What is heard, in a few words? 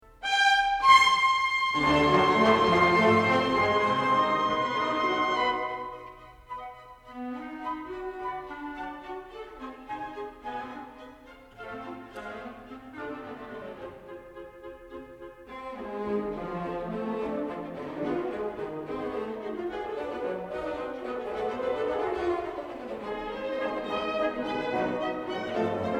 Allegretto vivo e fantastico